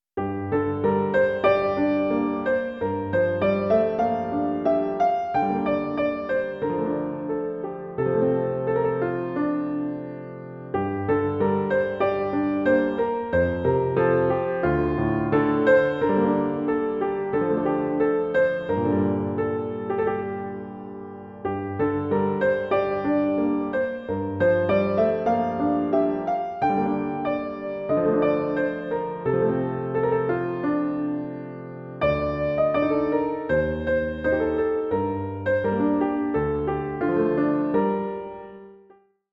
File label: irish tunes piano solo; 2 irish tunes